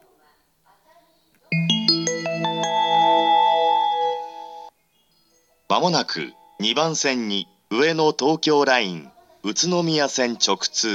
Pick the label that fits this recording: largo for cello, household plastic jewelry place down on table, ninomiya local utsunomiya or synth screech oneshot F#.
ninomiya local utsunomiya